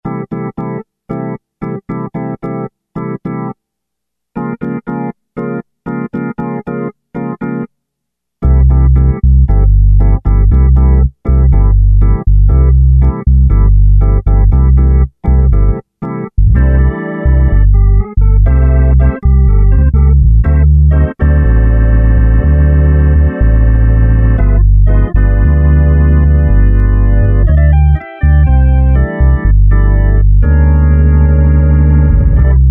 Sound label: Elec Org Pdl; Sanctuary